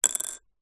household broken ceramic cup pieces down on surface; zapsplat